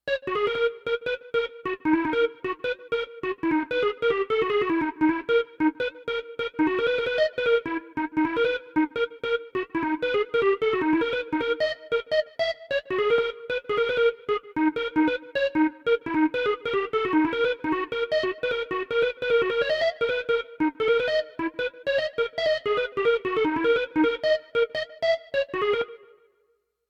Melody; chippy

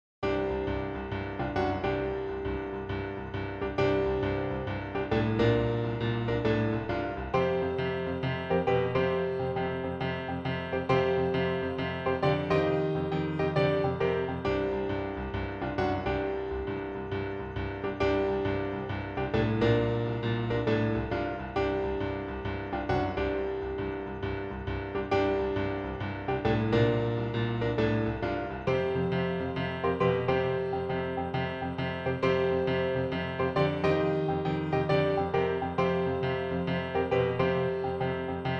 Bar Piano; Alekhine's Gun; PS4

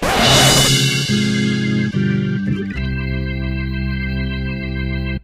Level failed

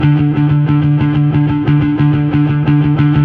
Fuzz E harm; Torchlight III; PS4

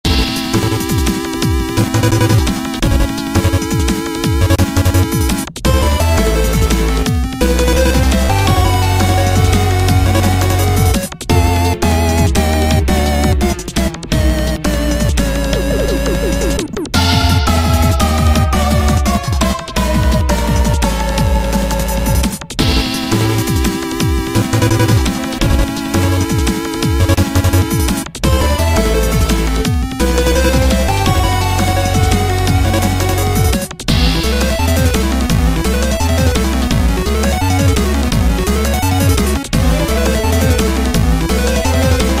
Level Boss; katatak; android ios mobile gamerip

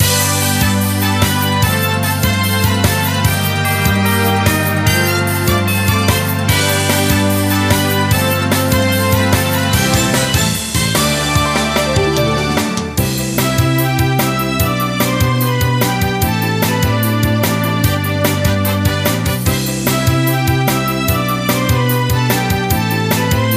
Music Menu event

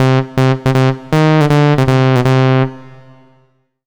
MUSIC EFFECT Solo Clean Sawtooth Negative; startide macos switch windows gamerip 2017

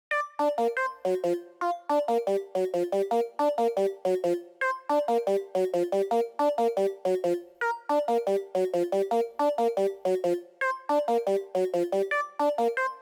Melody; chippy

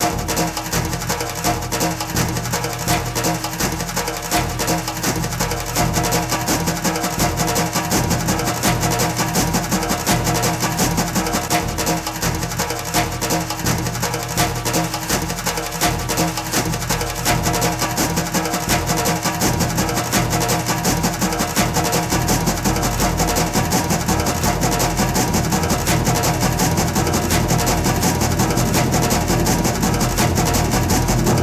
Fight Percus; Ballets

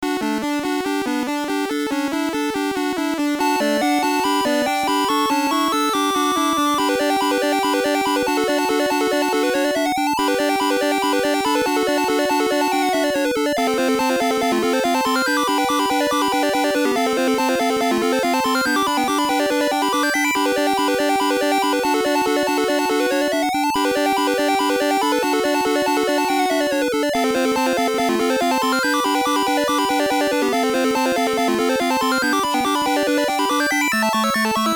BGM; Kyousouba Ikusei Simulation Keiba; WonderSwan

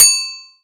right bell; birthday party bash; wii

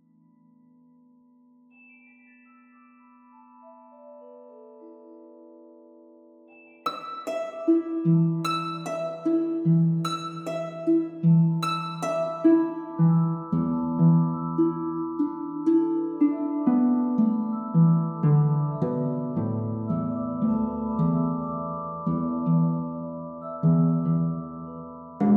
Harp C; Camp

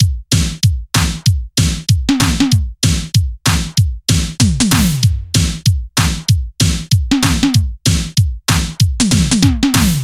Drum loop; vostok inc. switch gamerip 2017

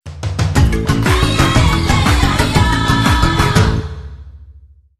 STAB

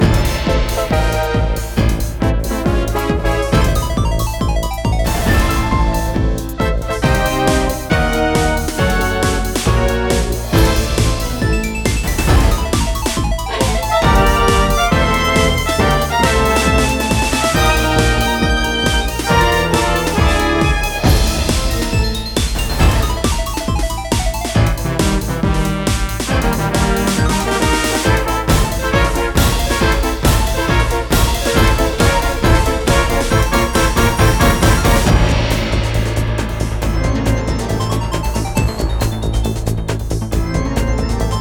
Boss Loop

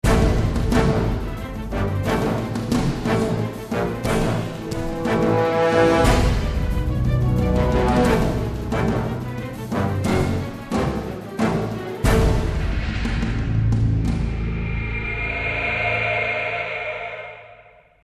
Action

